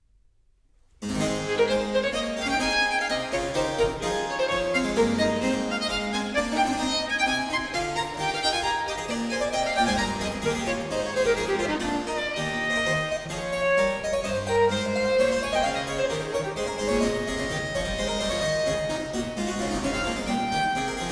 Sonata No. 2 in A Major, Allegro, Violin and Harpsichord; BWV 1015; Bach; J.S. Bach